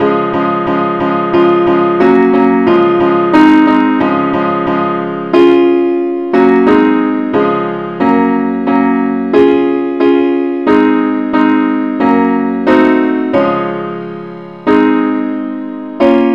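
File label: simple accompaniment; christe Sanctorum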